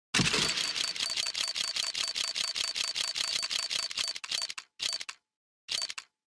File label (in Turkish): kutu acilis; cstrike